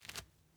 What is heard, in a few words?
masonry jump; teardown; ps5 windows xbox series xs